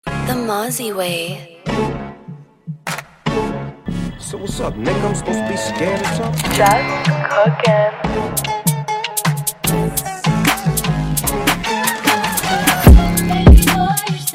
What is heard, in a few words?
Freestyle